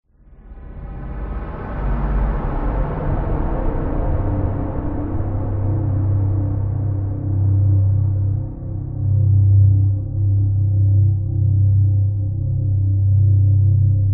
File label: horror atmosphere dark sinister heavy depressing; scott lawlor